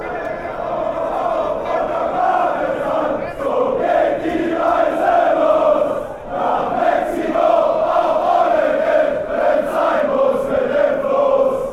chant, fan chants; eintracht frankfurt

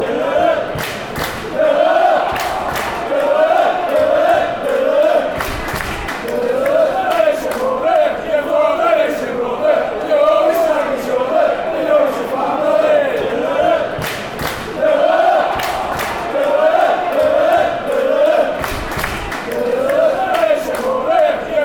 yorkshire family, fan chants

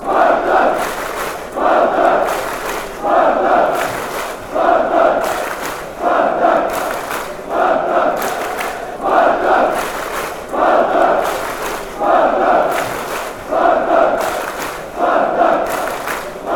clap clap clap, chants; Простая кричалка на английском языке, исполняемая на каждом матче; spartak

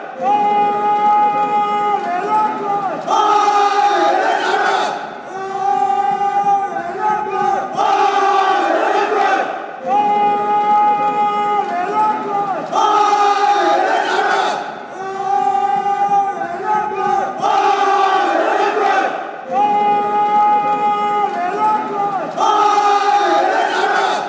allez les bleus allez, fan chants

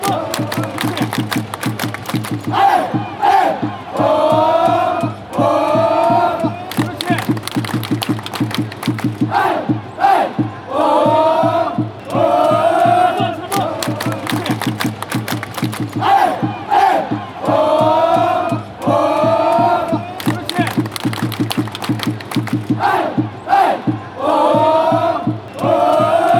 oh oh ay ay, fanchants

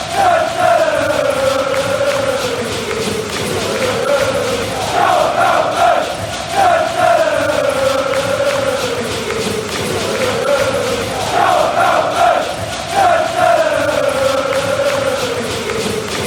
chant; joel veltman; Brighton football chant; team for the boys in blue and white

